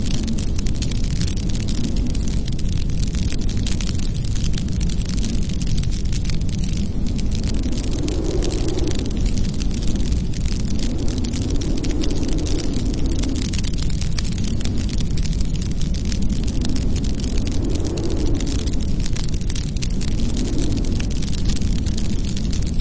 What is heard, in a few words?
wildfire, weather; simple-weather